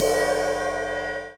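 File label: crash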